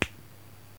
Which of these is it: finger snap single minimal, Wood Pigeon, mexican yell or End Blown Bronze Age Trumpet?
finger snap single minimal